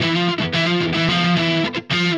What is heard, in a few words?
Guitar, Indie Rock; Session 01 - Brighton; Sonic Foundry (Sony Creative Software); Indie Rock Anthems